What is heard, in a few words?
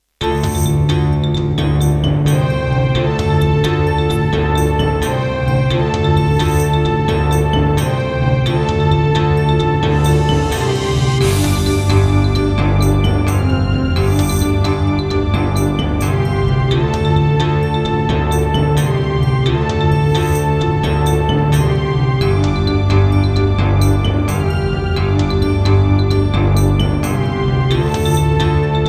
Roland SD-50 GM2 MODE, wavetable; Heretic